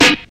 airy sizzle snare drum d key, Royality free acoustic snare sound tuned to the D note.; snare drum samples